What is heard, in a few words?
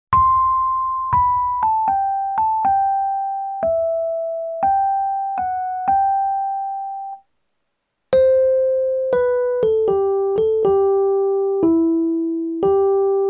Raummaschine E-Piano; TEGELER